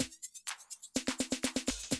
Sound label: 125, Classic Drum Loops